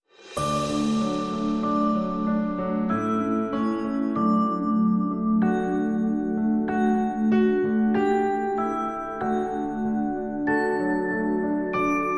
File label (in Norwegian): Deilig Er Jorden (Norwegian Christmas Song Based On Schesien Melody), Verse 3